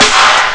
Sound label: hall tomtom; Amiga Soundtracker Sample Packs